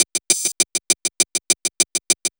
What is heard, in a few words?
BEAT, funk; USB Soundscan vol.47; AKAI; Hip-Hop & R&B